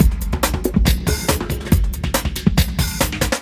Breakbeat; Vengeance Samples; VEC2 Loops

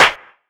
Snares & Claps; Vengeance Samples

